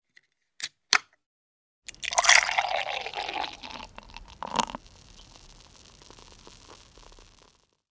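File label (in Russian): open, pour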